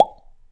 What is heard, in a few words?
pickup